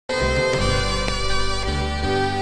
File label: thump